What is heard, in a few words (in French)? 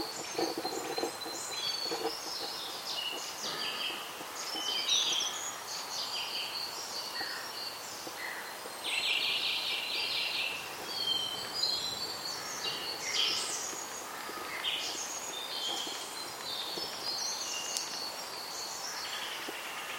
grive musicienne